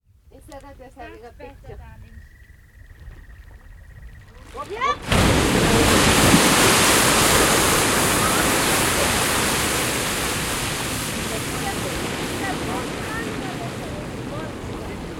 Iceland Geyser Erupting Twice Tourists Reacting Talking; Islanti Geysir Purkautuu Turistit